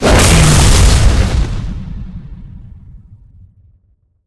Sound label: hammer